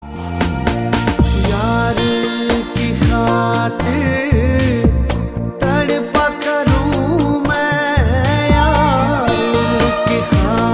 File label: New Pop